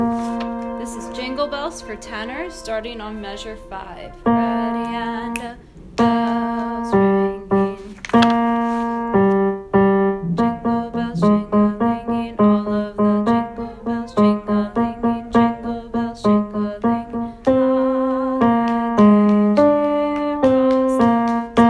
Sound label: jingle bells tenor